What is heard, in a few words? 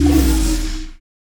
beam; spacemap